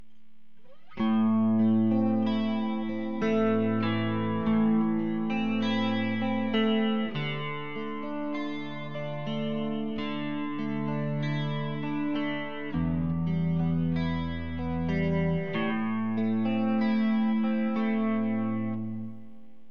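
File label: reverse polarity neck clean, Strat; rwrp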